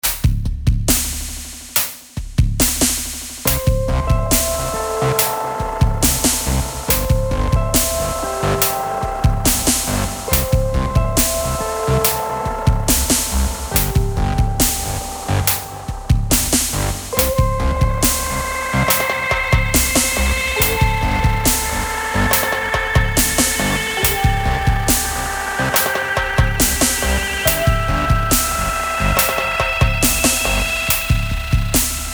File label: Stretched Steppa